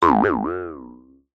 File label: cartoon boing squirrely jaw harp; zapsplat